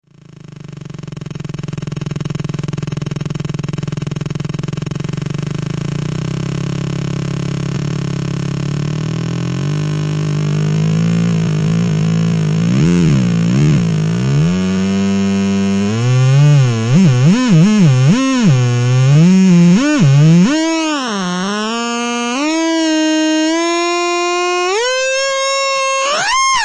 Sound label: FUZZFACT SELFOSC